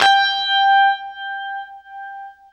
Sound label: UNIVIB G, Guitar; East West; Steve Stevens Guitar Samples Collection